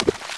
water small splash

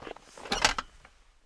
chair get up

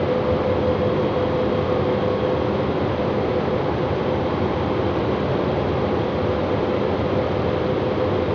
fan

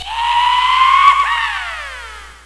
yeeha

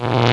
alien switch on; buttons

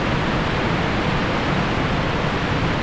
waterfall huge distant

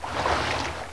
water walk

